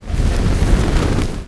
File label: fire